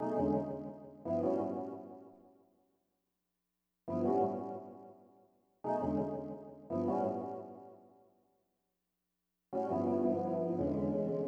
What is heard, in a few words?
Electric Piano